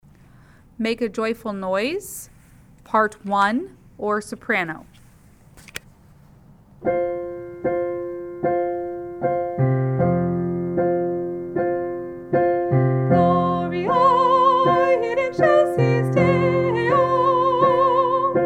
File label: Make a Joyful Noise Soprano